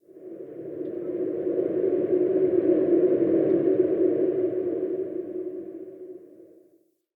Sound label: Under City Wind Howl; Ambience